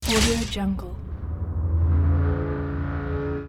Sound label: searing metallic impact zappy laser blast; برخورد لیزر به فلز; industrial sounds